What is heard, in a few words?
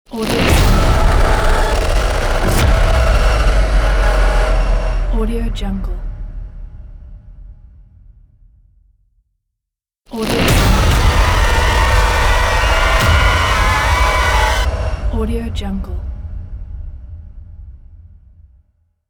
scary horror impact